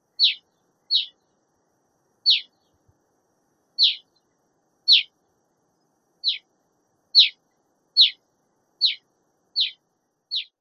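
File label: calls, Black Rosy-Finch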